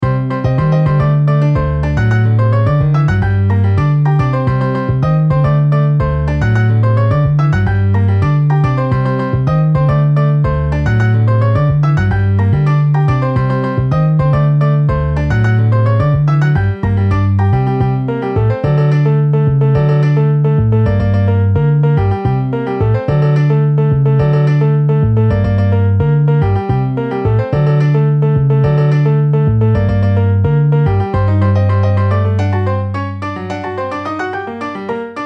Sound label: Piano Bass